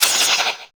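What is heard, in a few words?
dwarf axe weapon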